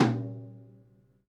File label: Tom Tight High Vol